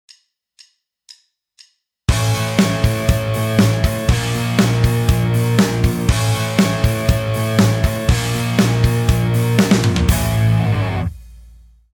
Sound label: backing; Guitar Techniques 2017 Issue 272 August